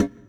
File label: EQ Perc, Percussion Mix